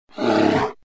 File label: grizzly Bear Roar short